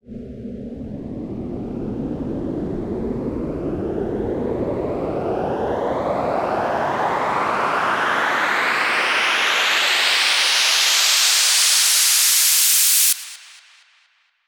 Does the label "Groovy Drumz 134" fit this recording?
No